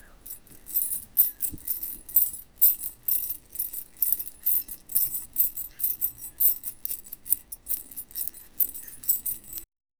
indian bangles of woman

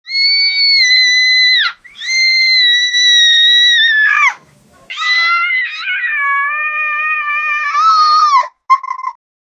rone wala kids

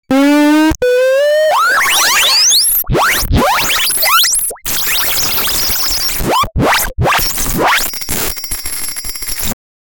create a v16 engine for, motorsport

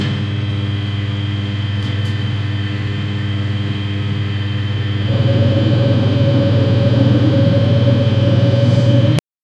the nuclear reactor is

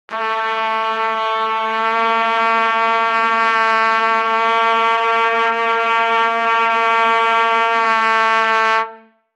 triumph trumpet fast

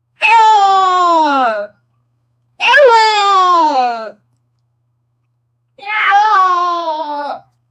female bubbly martial art

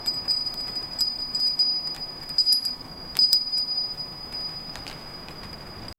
snowfall winter ambiance

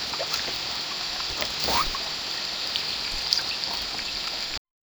crisp rustling of frog we; duck

